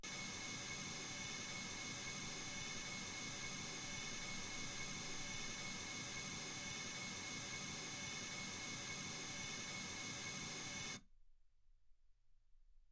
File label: mls 48kHz